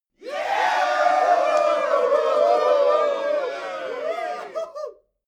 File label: male crowd cheering short